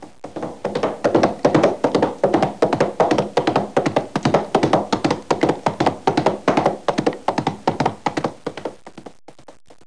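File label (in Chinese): ma jiaosheng, horse neighing; jiachujiaosheng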